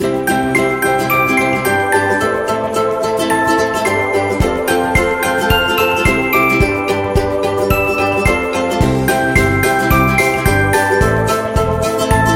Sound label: Uplifting Christmas Song